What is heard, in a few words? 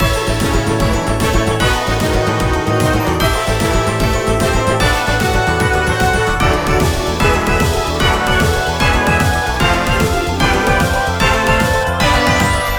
bonus time day